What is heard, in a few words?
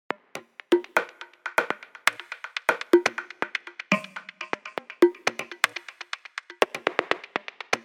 122 BPM Congas; mmb drum arsenal vol 1